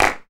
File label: clap one shot g key, Royality free clap single shot tuned to the G note. Loudest frequency: 1743Hz; percussion; single hits one shots